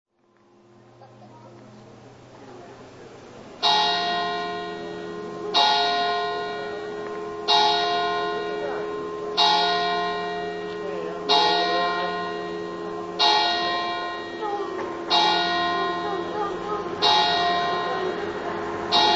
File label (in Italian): barcis, campane